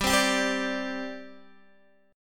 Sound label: G Suspended 4th, Guitar, Open D